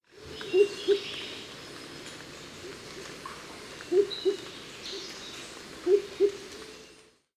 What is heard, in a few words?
momotus lessonii, aves; historia natural; galeria biodiversidad